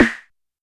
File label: Snare Analog, YAMAHA RM1X